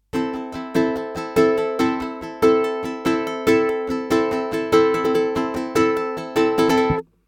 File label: Spanish, uke